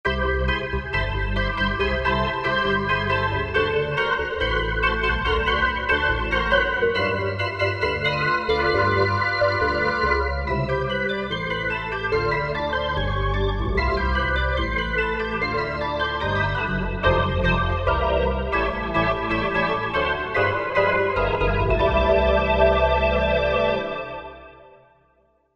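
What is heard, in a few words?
Electric Organ, Korg MSC-1S; Korg MSC-1S Variety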